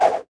tower stomp path jump out; crocs

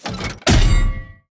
enemy darksteel guardian attack; sfx

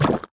mud summon; wukong princess

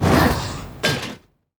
enemy animated armor death; sfx

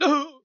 Human Dead

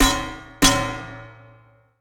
hoplite summon growl

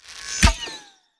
ricochet cast; heroes vesper

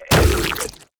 death; enemy; hardened horror

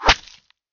Arrow Hit

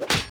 combat melee attack no sword

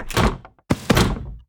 elixir of might; tower; brew master

